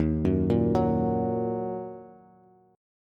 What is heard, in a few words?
Eb Minor, Bass; Ebm arpeggiated; 11,9,8,11; JGuitar